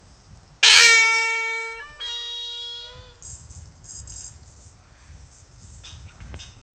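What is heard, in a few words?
a bull grunt sound of, livestock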